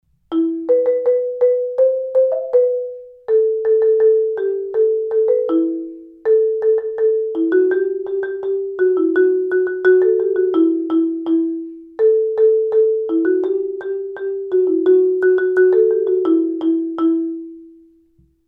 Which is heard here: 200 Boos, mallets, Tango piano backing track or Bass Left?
mallets